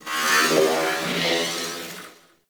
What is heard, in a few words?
Corpus Ship Metallic Robo Chatter; Warframe; Corpus Ship Remaster